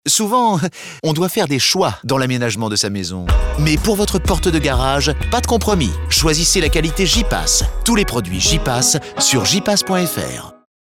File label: SPOT MUSIQUE